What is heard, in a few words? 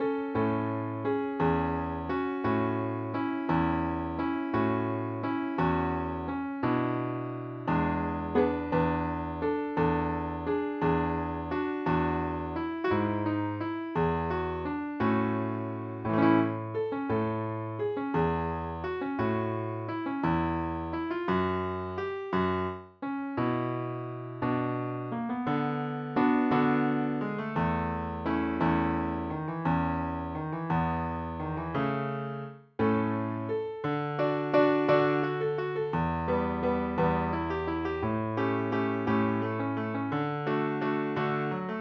guitar; garden waltz